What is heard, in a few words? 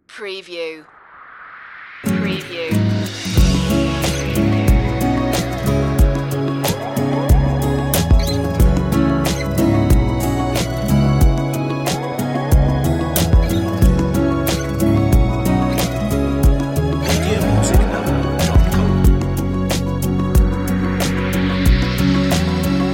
chill acoustic